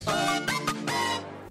Science Garage sound

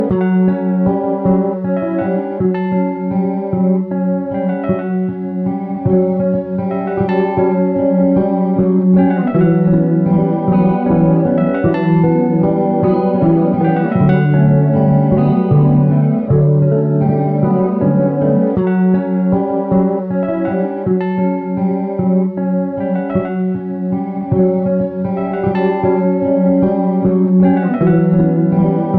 instrumental; Bleach

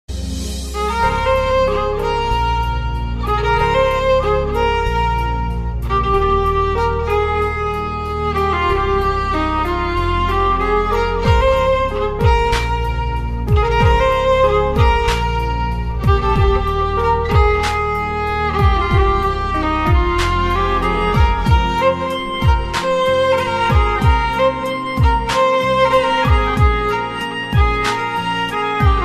Romantic Violin

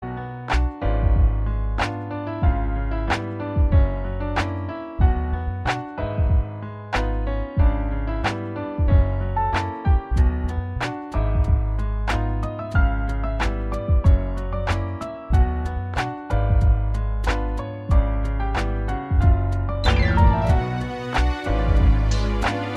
piano lofi

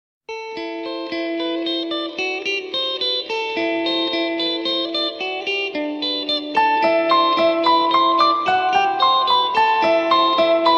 Tono; Death Note

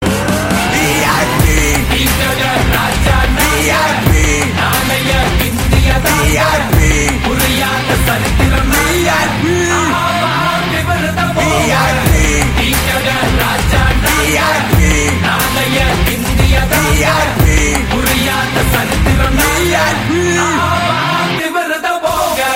VIP ringtone

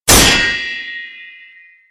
Deflect; Sekiro

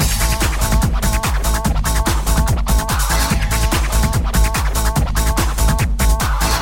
Hyper scratch jam

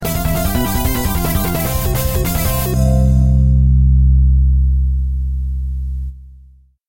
Balinese Sms Tones

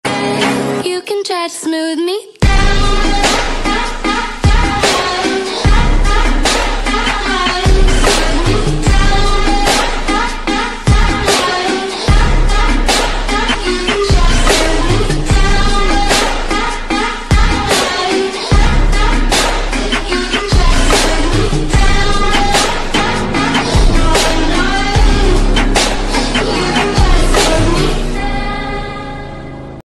slowed

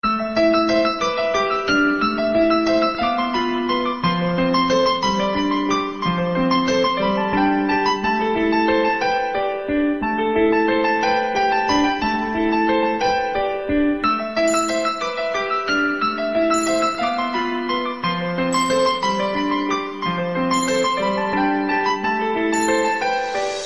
Classical musi

